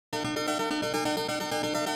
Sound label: guitar, 130BPM; Pony Fan Music Remix Stems